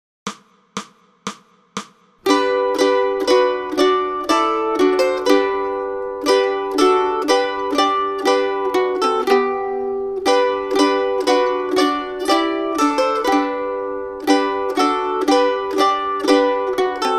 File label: Mandolin; Angels We Have Heard on High